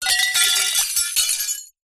impacts glass pieces thin multiple drop ground smash